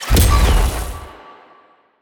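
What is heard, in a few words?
metal shield, impact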